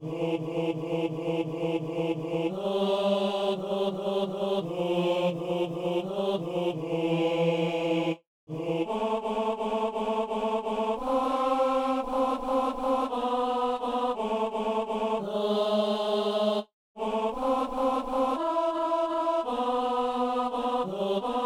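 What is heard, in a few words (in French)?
ténor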